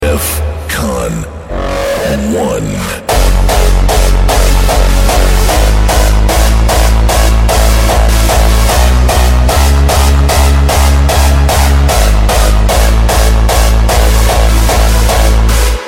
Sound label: Hardstyle